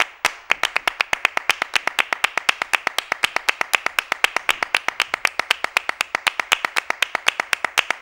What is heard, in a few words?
LONG CLAP, LNG MULTCLAP; Best Service; World Colours